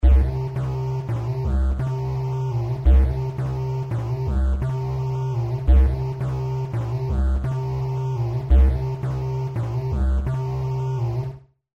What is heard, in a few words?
bass